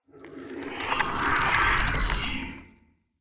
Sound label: Bow Charge; Weapons; Player; Bows; Fey Thorn Bow